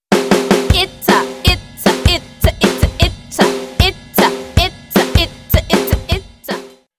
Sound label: Chant